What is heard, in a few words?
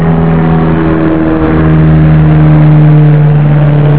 Cessna